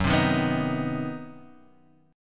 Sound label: C Minor Major 7th Flat 5th F sharp, chord